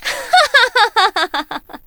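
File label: ambience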